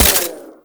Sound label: Dry Fire, Weapons; Warframe; Fulmin Prime